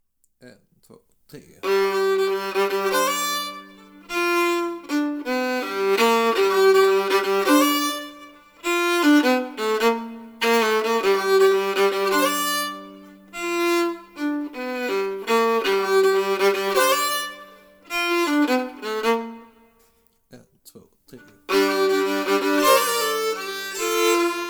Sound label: Valssi riff